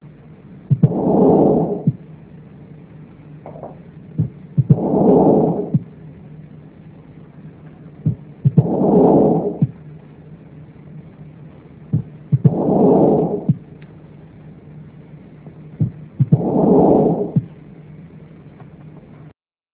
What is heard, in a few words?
HeartSound